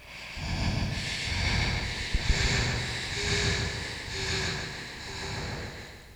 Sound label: haahaa